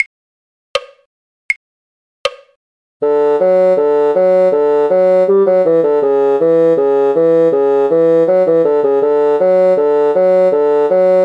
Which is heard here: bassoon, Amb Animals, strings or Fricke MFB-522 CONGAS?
bassoon